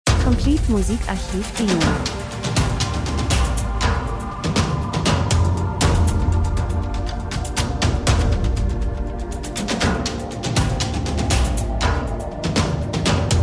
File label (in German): drums, rhythmus; Nachdenken geheimnisvoll Spannung schweben gleiten Natur Reisen Film Pauken Klangfläche